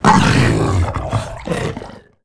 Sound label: rakghoul dead; Star Wars Knights Of The Old Republic I; XBOX